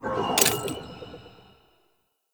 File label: Wrist Gun Transform; Warframe; Tenno; Incarnon